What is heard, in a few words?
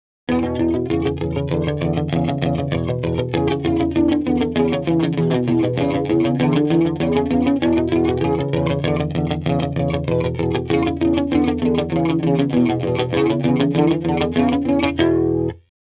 Flanger